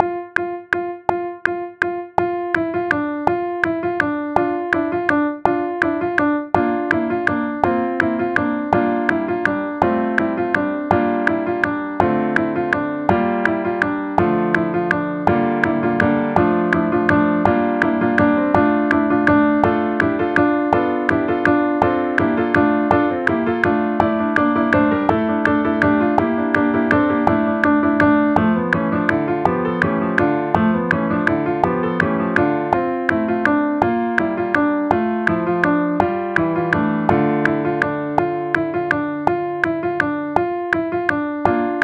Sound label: carol of the bells clicks piano